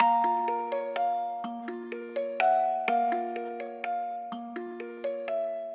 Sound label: music box; Identity V